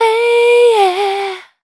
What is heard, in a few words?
VOC F, Dance Vocals, FEMALE